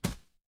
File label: retro game hit